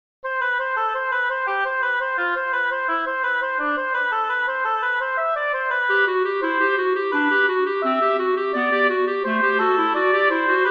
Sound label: fugue major for woodwind trio; bach johann sebastian